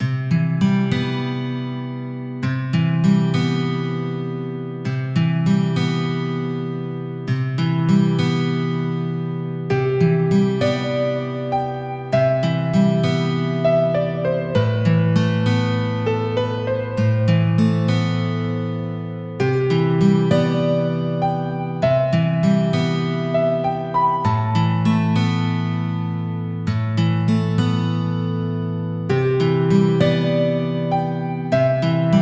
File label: Recall bpm 99